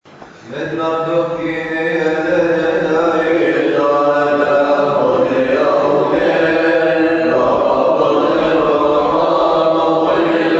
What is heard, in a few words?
syrian chant